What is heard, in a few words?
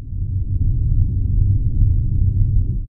low hum; CosmicRage; planetoid